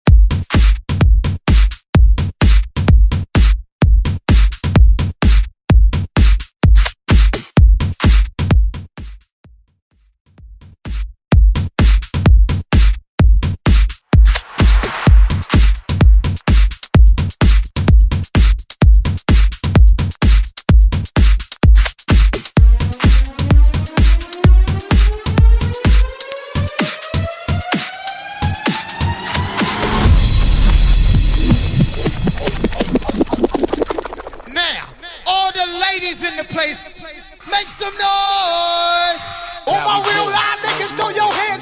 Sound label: 90s House Party Break 128 Bpm; Mainstream VIP; Vegas Edit